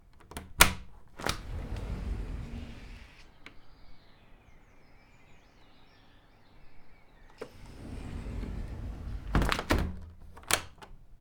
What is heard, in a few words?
Door Window Open Close